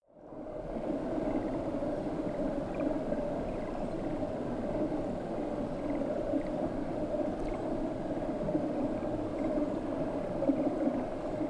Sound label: water fx spooky